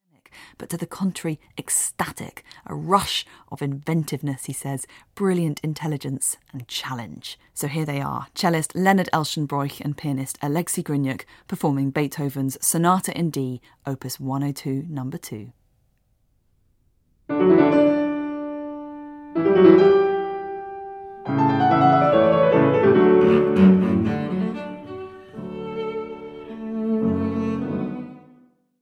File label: sonata in D major for cello and piano; ludwig van beethoven; op 102 2